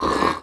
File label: gurp